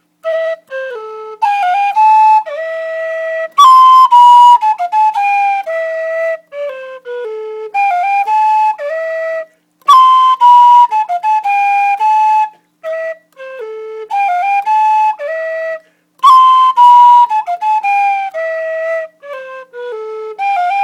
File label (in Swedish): Polska, harjedalspipa; Olof Ward